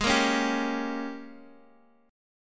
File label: Ab Major 7th Suspended 2nd Suspended 4th, Guitar, DADGAD; x,x,6,3,4,5